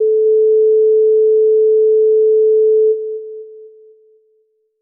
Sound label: SINEWAVE; PioneerDJ; Sampler; OSC SAMPLER; ONESHOT